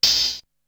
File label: Open hat; ZERO G - Datafile DISC 2